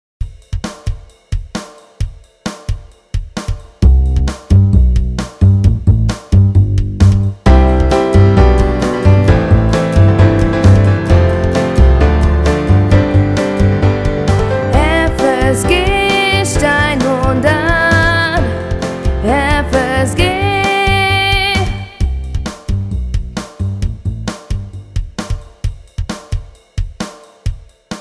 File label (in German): Jingle Projekt; steinhundert